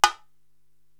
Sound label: Bongo Hi Stick, Korg TR-Rack